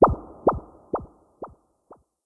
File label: Emoticon Motion Zzz; blue archive